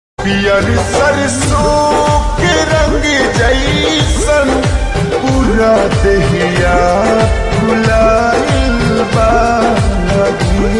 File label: Slowed Reverb